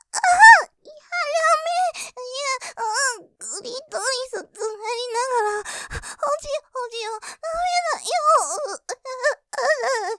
喘ぎ クリトリスいじいじ、ケツ穴ほじほじ; モノラル; ループで楽しむ！シェフの気まぐれ抜き抜きコース

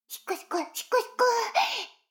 シコシコ音声, ASMR; 逆時間停止されて

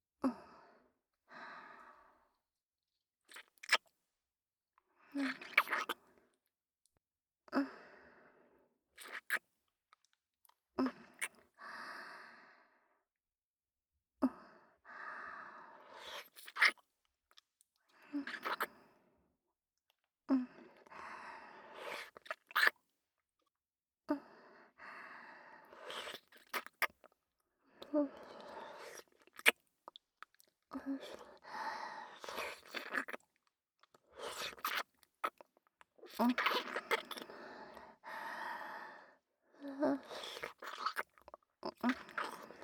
キス

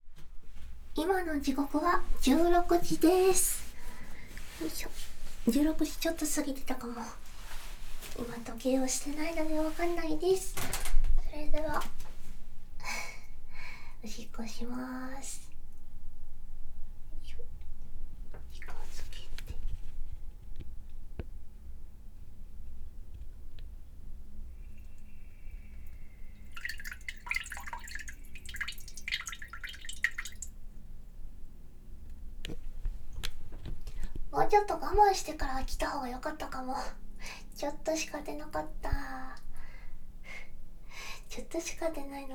おしっこです！; ５日目の４回目のおしっこです！

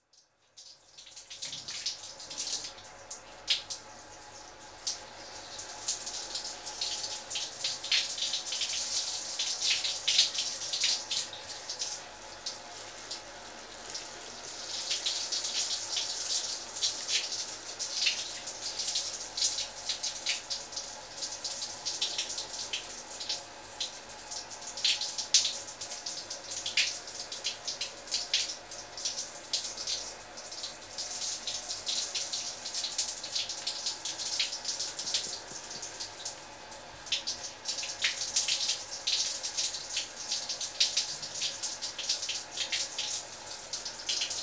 シャンプーを流す; 音フェチさんのための効果音抜粋 vol.1

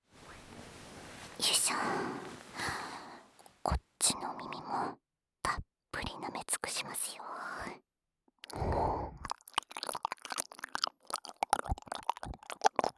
焦らしの耳なめ(左), ASMR

